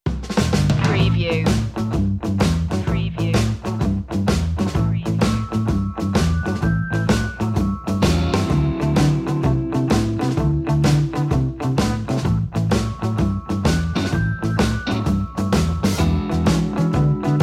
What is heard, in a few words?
instrumental